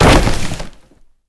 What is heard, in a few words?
shocked; scuttlebutt